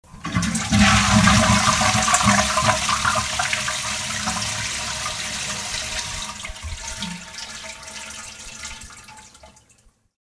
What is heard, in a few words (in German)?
WC spuelung; Haushalt